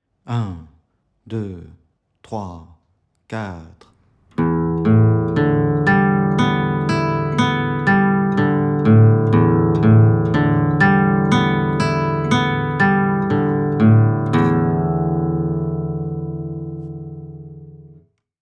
exercice e 060bpm

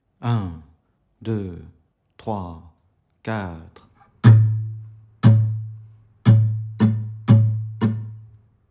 exercice 060bpm 1 mesure

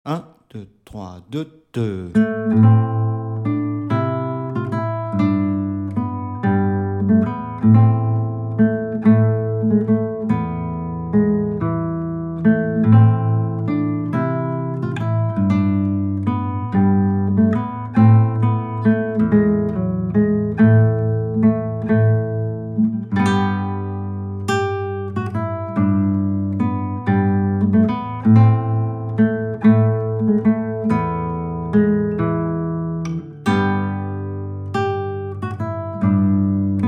exercice 140bpm

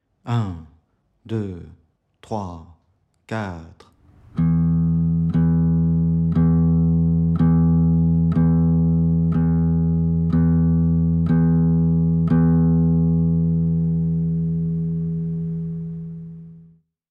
exercice 060bpm

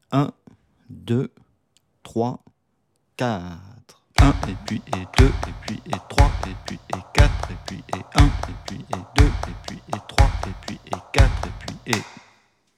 methode pour travailler un rythme decoupe alternative 060bpm, guitare

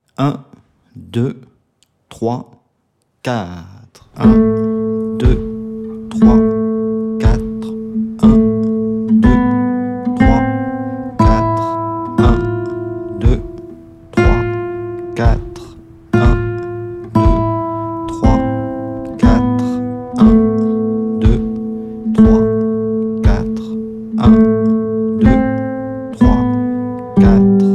exercice bis 060bpm

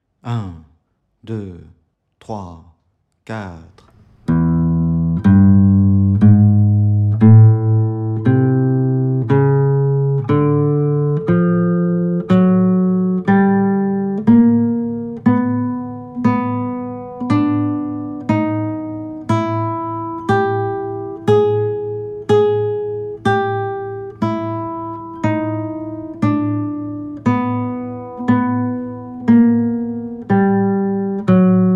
exercice 060bpm

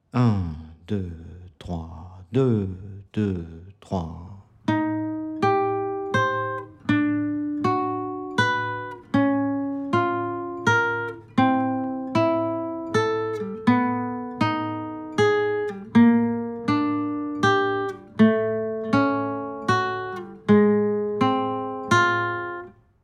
exercice petit barre sur 3 cordes exercice a 080bpm

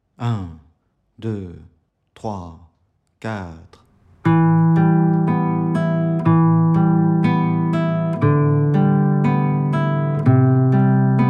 arpege ascendant 060bpm, guitare

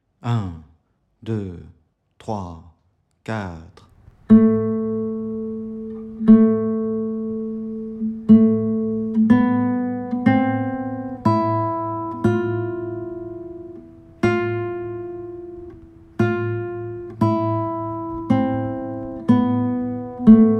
exercice 060bpm

